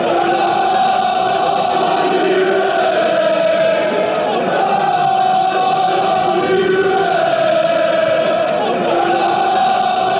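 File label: come bournemouth, football chants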